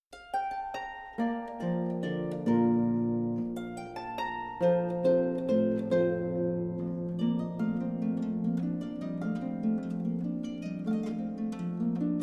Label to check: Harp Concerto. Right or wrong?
Right